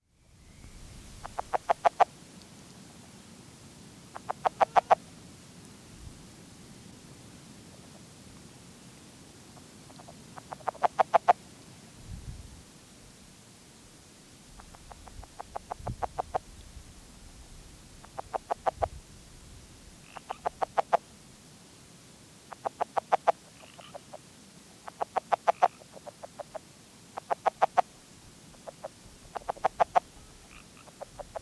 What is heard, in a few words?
Red legged frog